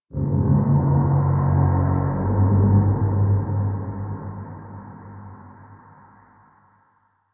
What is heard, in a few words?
Chimera Main Room Groan One Off; Ambience; Warframe; DE